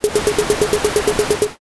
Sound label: gear